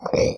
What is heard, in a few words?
Exhausted; Amanita; oxeyewiki